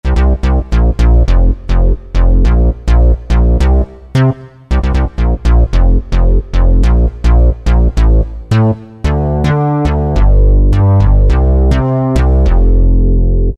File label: Synth Bass, Korg N364; Factory Prog demos